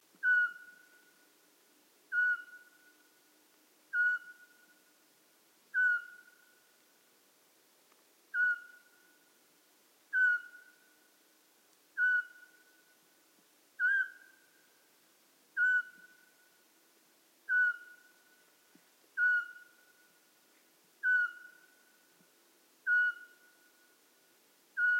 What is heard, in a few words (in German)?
Sperlingskauz Glaucidium passerinum